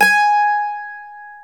MANDOLIN

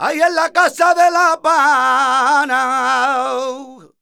voc c, Flamenco Vocals Male Cantaor

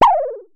Synare Filter; Star Instruments Synare 3

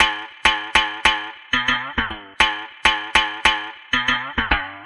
Diddly Bow, Strings; Sonic Foundry (Sony Creative Software); Myths of Technology

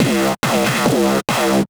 Fills, Loops 140 BPM

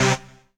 HOUSE, House Dance

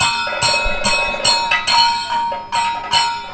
KATAK, Indian Traditions

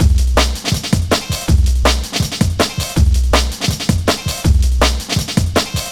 Deamon 162, Jungle Straight Loops; Zero-G Jungle Warfare 1, 2 & 3

